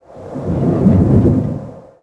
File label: Cap Walk